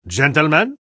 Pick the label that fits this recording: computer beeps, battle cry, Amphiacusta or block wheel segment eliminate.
battle cry